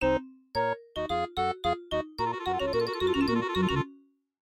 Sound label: MIDI Animation stings